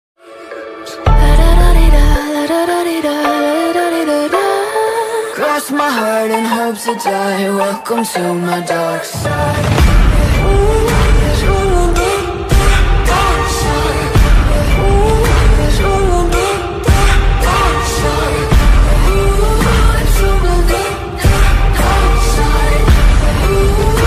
attitude ringtone bad boy

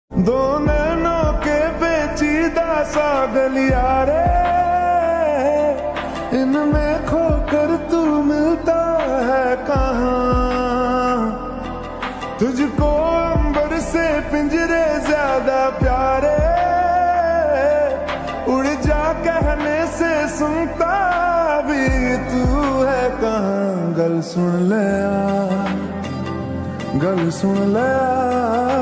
slowed reverb lofi remix ringtone love ringtone

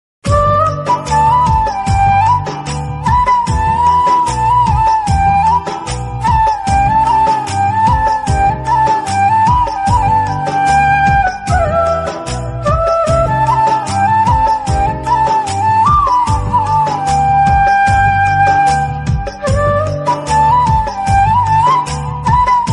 love bgm ringtone

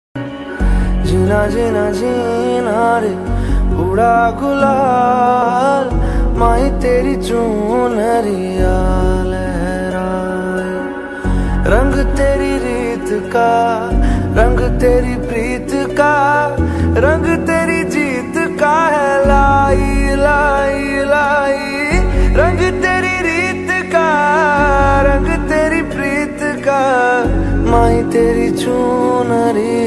hindi ringtone

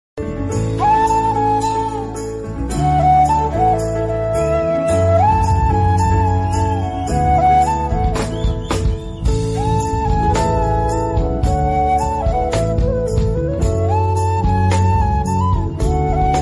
love bgm ringtone instrumental love; vaaranam aayiram

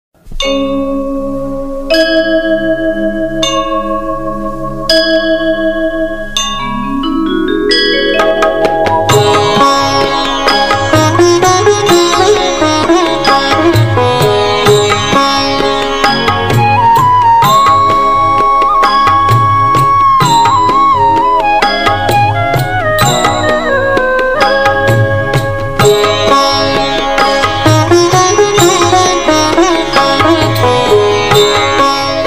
devotional ringtone instrumental ringtone; shiv stuti

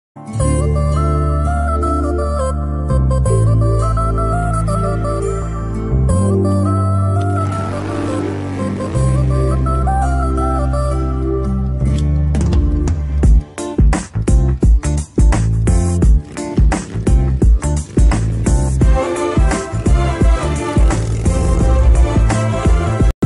telugu movie ringtone, lovely ringtone; mirchi

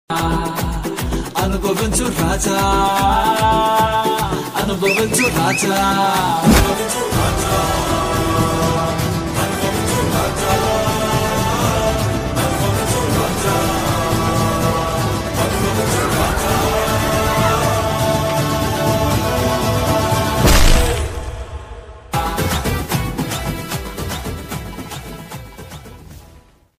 ringtone; anubavinchuraja movie

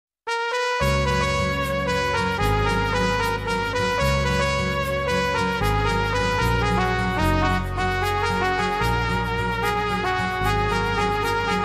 special ringtone, new song banjo, brass band; ekvira aai

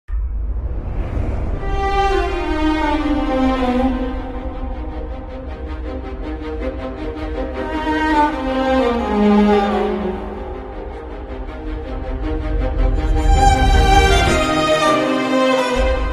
mass bgm ringtone; writer movie; samuthirakani

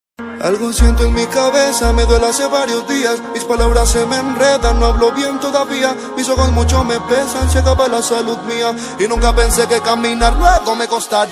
rap song ringtone

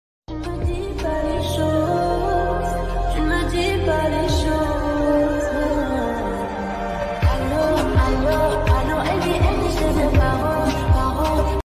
lofi remix ringtone

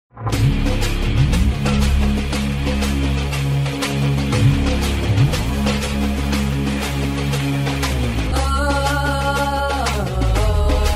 mass bgm ringtone; arjuna phalguna

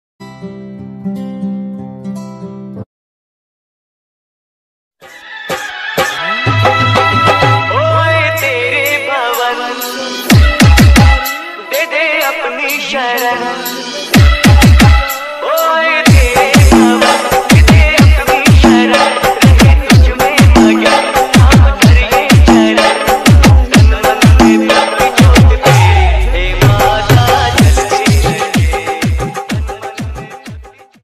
navratri ringtone instrumental; durga

